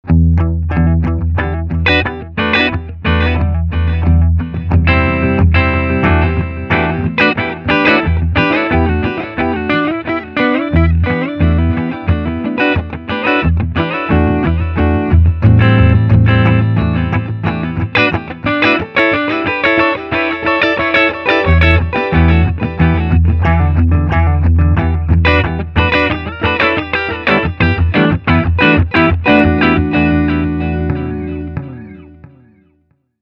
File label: Delay; Total Recall